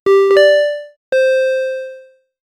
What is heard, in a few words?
match sound for puzzle ga